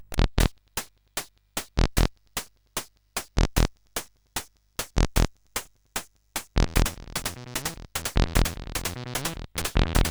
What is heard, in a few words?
C64 303 Emulator, CSG8580R5